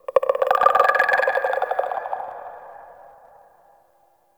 Infested Tendon Chatter; Warframe; Corpus Infested; Ambience

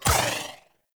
Dodge Impact; Warframe; Pacifist

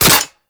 Wrist Gun Mech; Warframe; Tenno; Incarnon

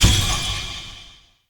Magic Parry Perfect Counter; Weapon Impacts; Player; Casting Devices

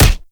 Fulmin Prime Alt Punch; Weapons

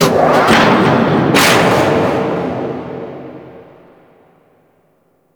Distant Gates, Fortress Ambience; Warframe; Grineer

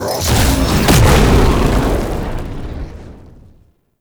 Execute; Daklo; Warframe; Tau; Betrayer

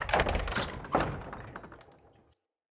Wood Double Door Open; UnderCity

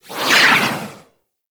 Doom Sword Projectile By; Warframe; Excalibur

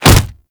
Generic Hit; Warframe; Characters; Tenno

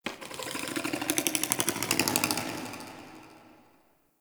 Exterior Creature; Ambience; Misc Creatures; Sentient Lair; Warframe

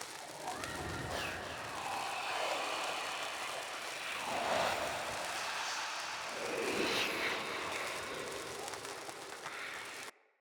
Blaze Armour Loop; Powers; Fenn

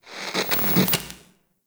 TV Turn On; Warframe; NineteenNinetyNine

